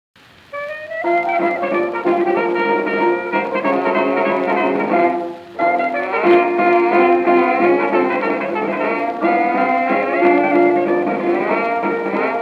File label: Blues